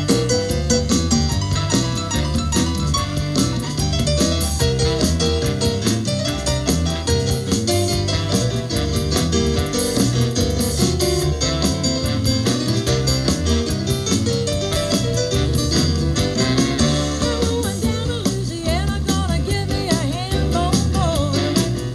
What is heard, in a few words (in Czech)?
nahravka mikrofon; Stradivari